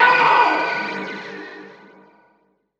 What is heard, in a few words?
loop; X-Static Goldmine 3